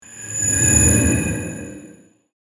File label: Magic spell whoosh